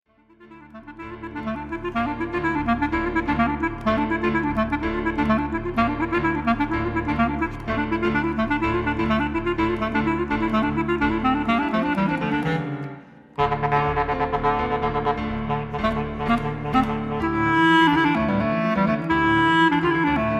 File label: clarinet piano